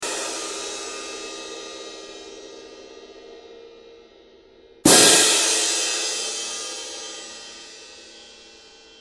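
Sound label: cymbals; talerze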